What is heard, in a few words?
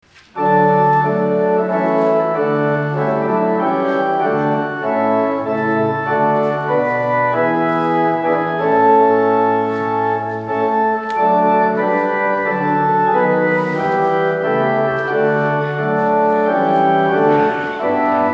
Hymn